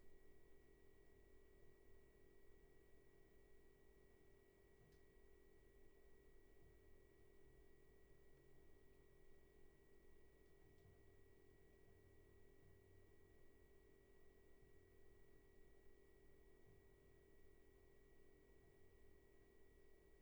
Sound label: roomtone